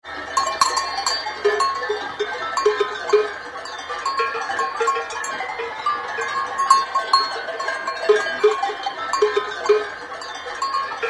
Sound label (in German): Kuhglocken